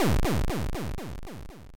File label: Explosion